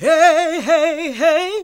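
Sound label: Gospel; Best Service Gigapack 1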